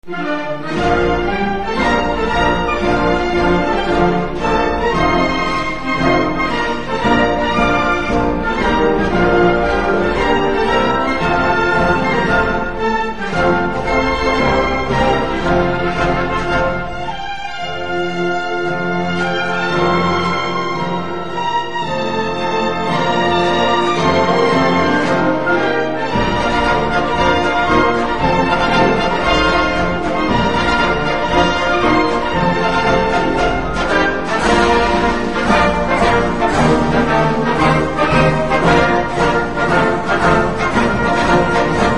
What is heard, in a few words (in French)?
hymne national belgique la brabanconne musique